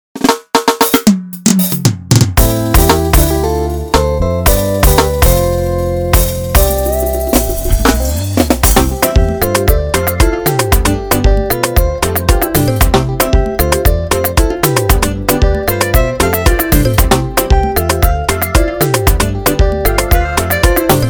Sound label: Instrumental